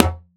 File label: Tom Mid; One Shots; Drums